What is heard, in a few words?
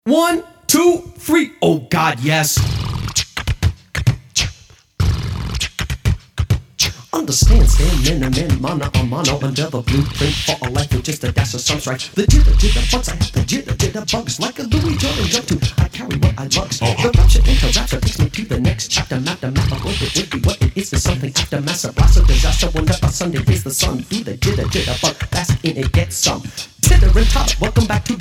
Beatbox Fuzz Sessions